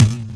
tom, drums; virtual drum sounds; custom drums

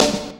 snare, drums; virtual drum sounds; custom drums

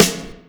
snare, drums; virtual drum sounds; custom drums

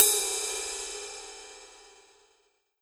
ride, virtual drum sounds

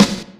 snare 1; virtual drum sounds; double pedal

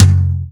tom, drums; virtual drum sounds; custom drums

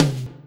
tom; virtual drum machine

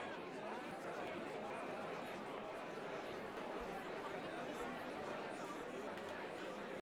live, drums; virtual drum sounds; custom drums

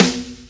snare 1; virtual drum sounds

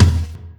tom 4; virtual drum sounds

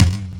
tom, drums; virtual drum sounds; custom drums

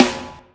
snare, drums; virtual drum sounds; custom drums

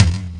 tom, drums; virtual drum sounds; custom drums

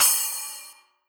splash, virtual drum sounds

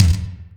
tom 4; virtual drum sounds

